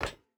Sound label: footstep hide metal run; Rust